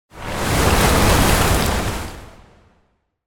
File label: hard morph short